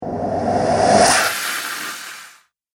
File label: Scream Vampire, Monster Sound Effects; horror